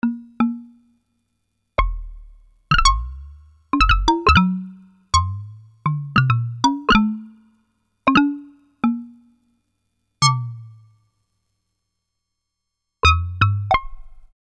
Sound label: Buchla Short; buchla 200; buchla modular